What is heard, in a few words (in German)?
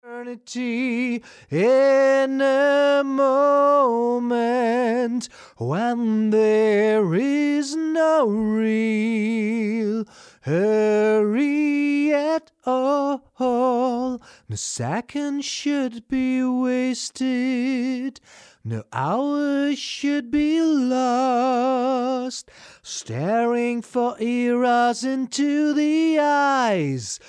superlux r102 vocals trocken